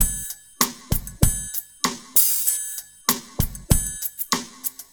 Drum Smooth; Drum Smooth4 A by Renato; TOB STUDIO 7

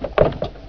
wing off

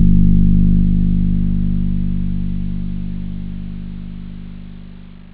gong

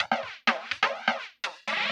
PERCLOOP HOUSE 125; eJay

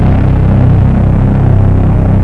island Rise; NETSTORM

